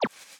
Sticker Negative; StickerClone

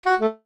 jingles saxophone; Kenney; Music Jingles

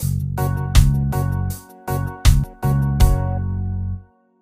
Reggae ident; Music Loops; Kenney; Idents

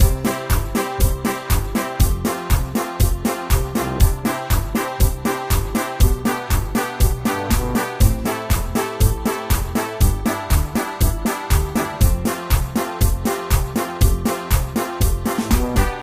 Polka Train, Music Loops; Kenney

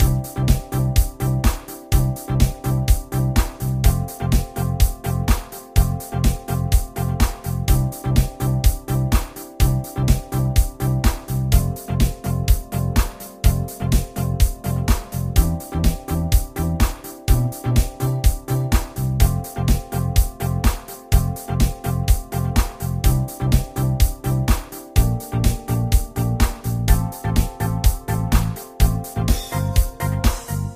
Music Loops; Kenney

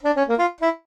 jingles saxophone; Kenney; Music Jingles

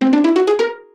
jingles pizzicato; Kenney; Music Jingles

